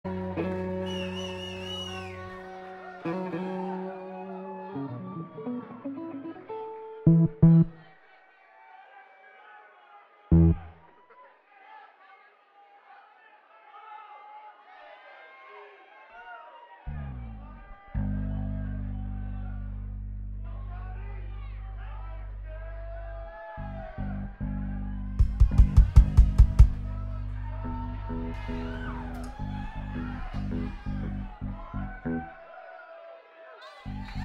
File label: Tuning